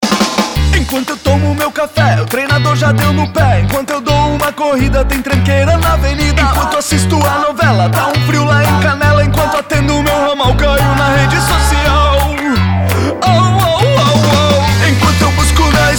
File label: JINGLE ROCKABILLY